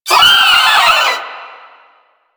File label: Proto Infested Volatile Runner Kamikaze Scream; Enemies; Abilities; Warframe